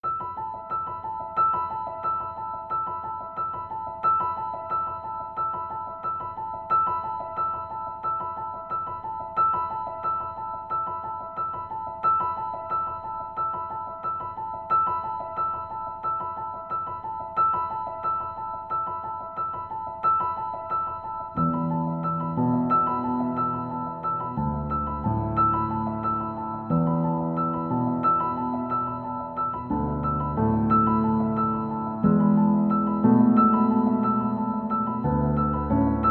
Piano Only; Celeste

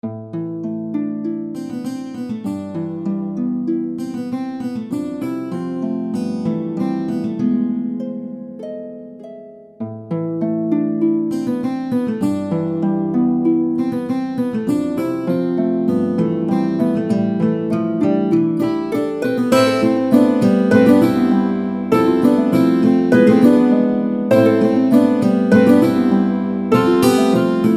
Acoustic

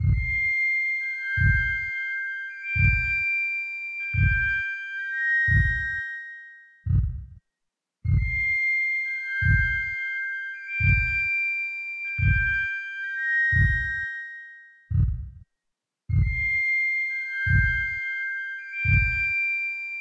heart beat (fast); Project Yume 2kki; Yume Nikki

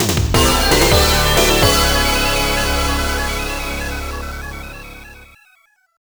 LOGO, msx; μLSOUND

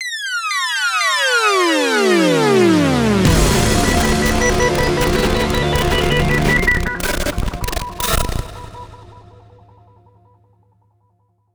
gameover

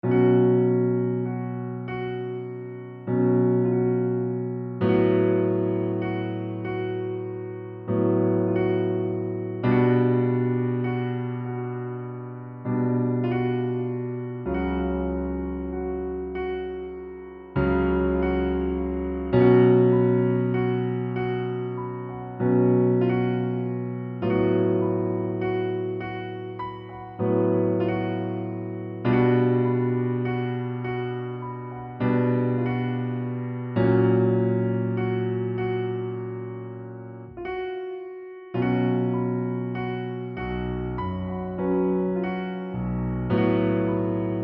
Acoustic

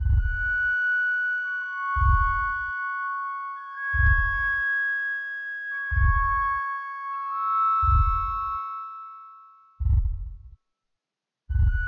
heart beat (slow); Project Yume 2kki; Yume Nikki

